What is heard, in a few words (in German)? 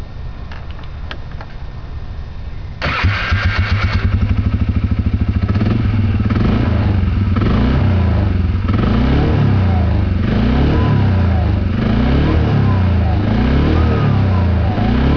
sound motorstart